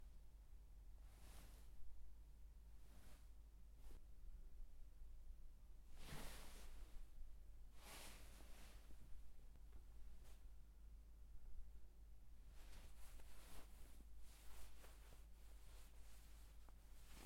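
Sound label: throwing off a duvet cover; mozhet bit ispolzovan dlya etogo ili dlya lyubogo drugogo dvizheniya tkan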